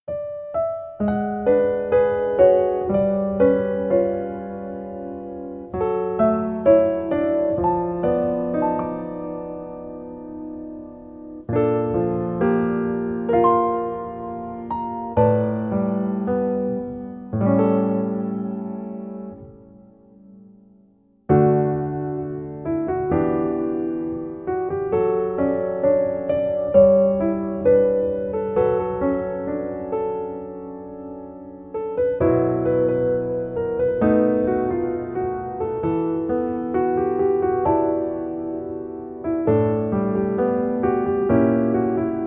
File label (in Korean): Piano ver 멜로디MR